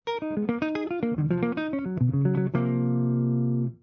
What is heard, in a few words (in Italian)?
Arpeggi Irregolari